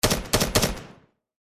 Famas G2 firing multiple bursts

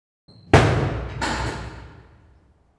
Jail door close prison distant perspective

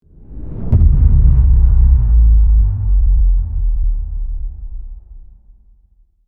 Deep intense boom rumble; cinematic

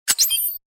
Night vision goggles power switch on off; Military Equipment

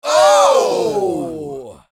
Crowd shocked reaction surprise

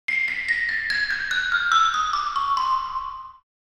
Cartoon xylophone descend

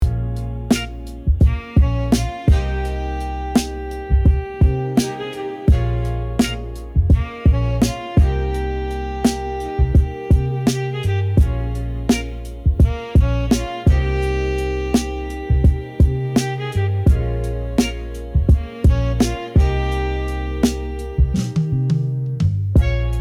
Boom bap beat vinyl dreams

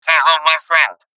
Electronic robot toy talking; rc toys

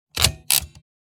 Casino game mechanical lever pull

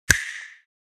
Cinematic finger snap

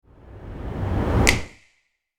Cinematic rise and snap; logo animation

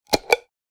Glass medicine jar lid opening

